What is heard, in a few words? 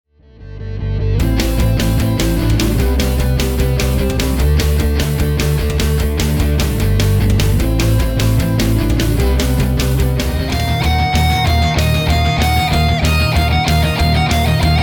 rockmusic